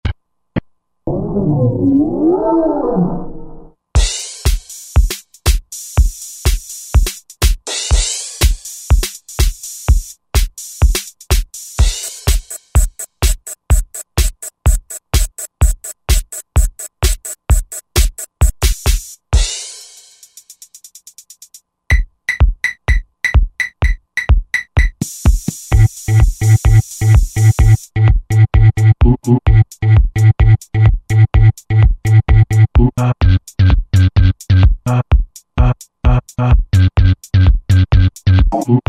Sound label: Rave Drum Set, Roland JV-2080; Rhythm